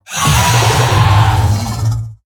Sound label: Battlefiend Play